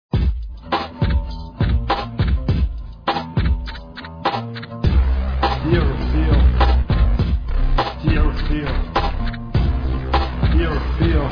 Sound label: Scary Techno Song Remix